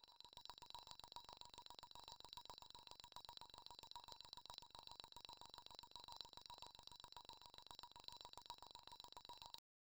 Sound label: casino reel sound high re, mechanical clicks